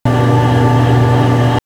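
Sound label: Fully Loaded, electronic fx; X-Static Goldmine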